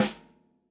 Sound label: snare, gretsch kit